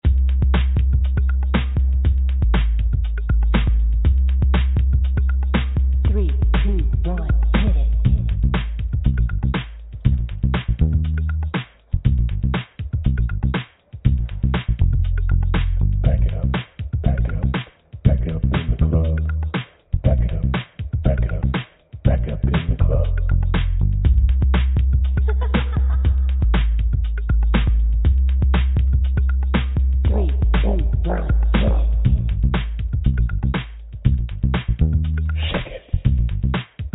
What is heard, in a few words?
Hip-hop, on hold messages